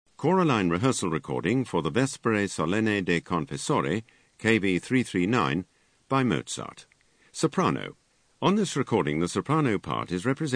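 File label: Sop, soprano; Mozart Vespers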